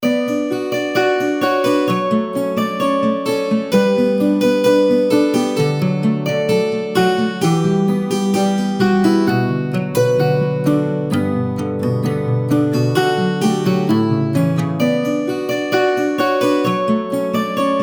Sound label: Tavern; Daz3D